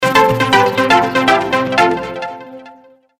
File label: Tone, ringtones